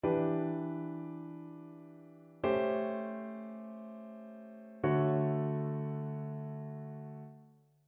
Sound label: ii V I progressions; ear training